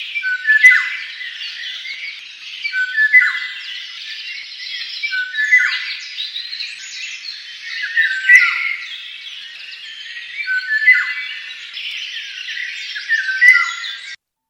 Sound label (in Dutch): Wielewaal geluid